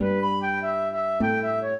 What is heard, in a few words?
trio, flute harp; Mozart